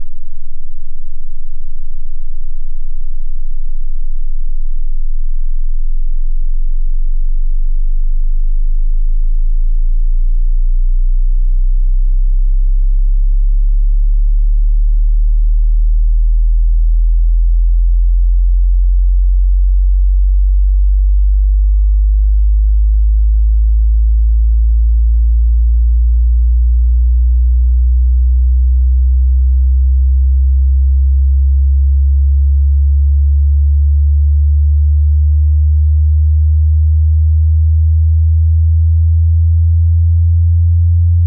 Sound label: sweep 10-120Hz linear; m6dBFS; 60s; 48k; stereo; s24